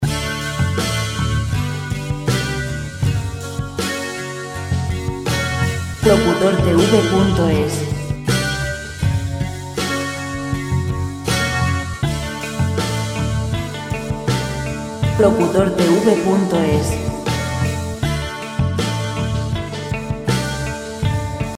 80 lent; relajante musica libre

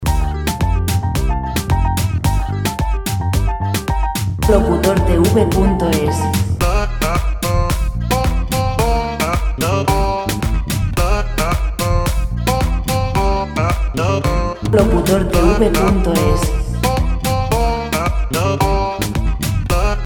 110 pop; pop musica libre

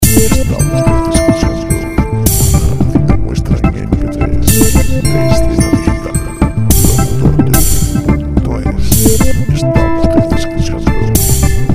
chill; music chillout

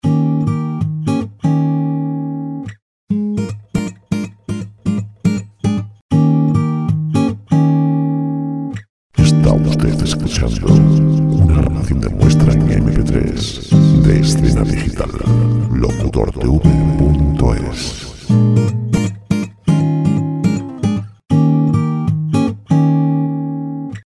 158 guitar; folk

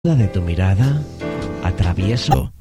dial 945, Dial 94.5 f.m.; Dial cantado; diales cantados; cantantes para anuncios; Cantantes de publicidad

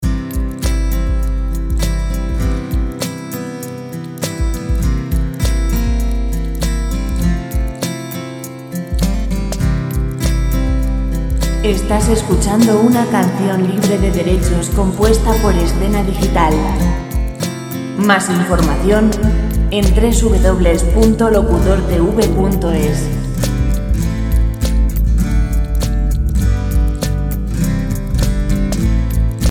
guitar lent; relajante musica libre

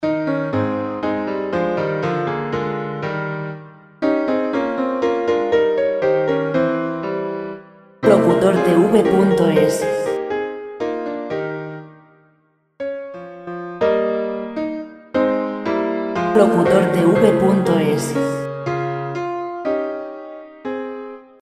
120 piano classic

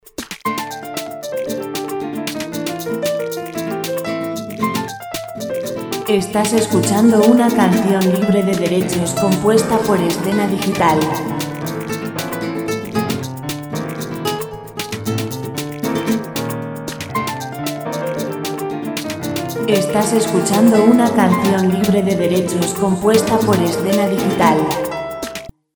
115 guitar folk music; folk musica libre

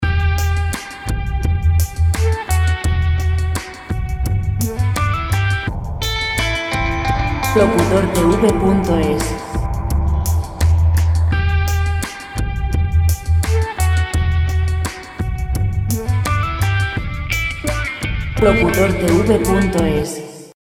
guitar chill; chillout

